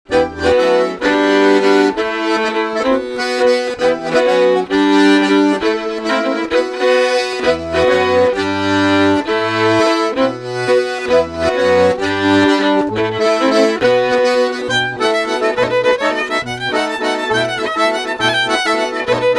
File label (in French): bourrées trad auvergne; Anglard; Oller